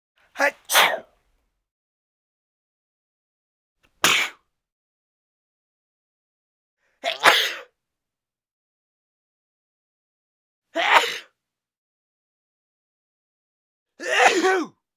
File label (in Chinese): 喷嚏男